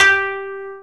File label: KOTO G3, Asian Traditions; USB Soundscan vol.60 - Asian Traditions; AKAI